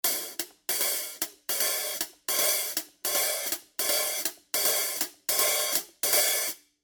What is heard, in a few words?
new beat hh open closed pattern